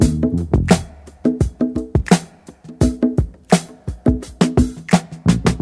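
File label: Hammond rif, Left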